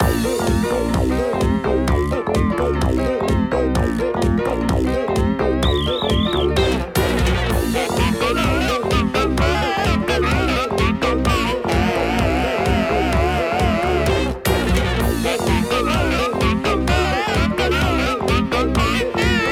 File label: Battle Low Health